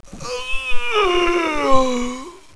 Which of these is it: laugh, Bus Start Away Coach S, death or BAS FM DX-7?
death